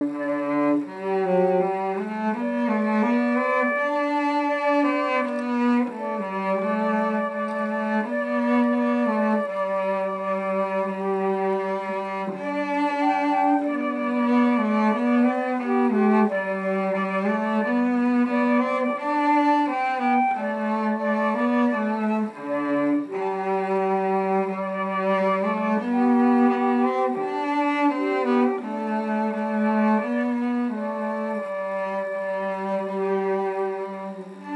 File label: Cello Flute DUO; Simple Gifts